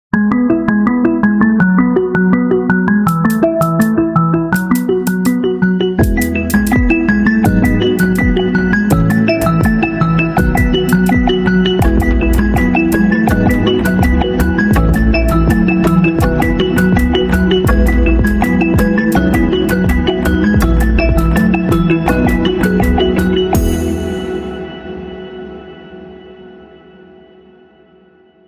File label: Custom Mix; Xiaomi